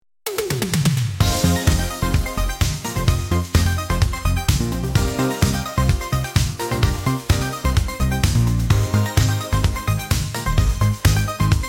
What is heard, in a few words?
Electro Funk Groove; StockTune